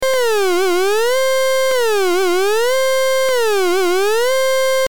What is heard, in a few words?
quart neg env, synth